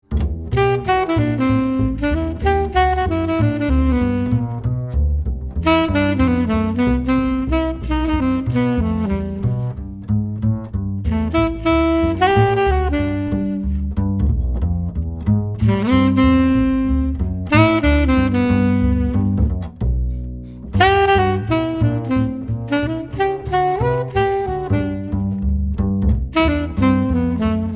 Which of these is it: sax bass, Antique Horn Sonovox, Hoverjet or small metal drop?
sax bass